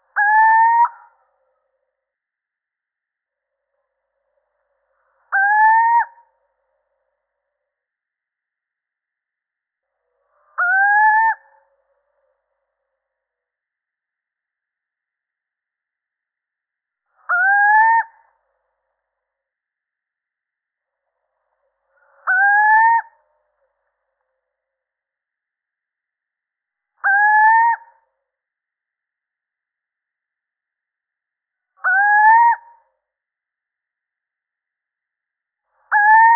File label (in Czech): Sycek obecny samec